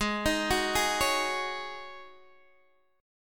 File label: G sharp 7th Suspended 4th G sharp, Guitar Open D